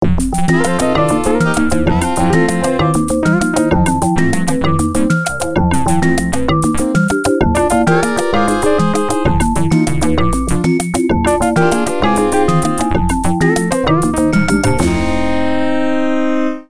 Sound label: 6 8 world blues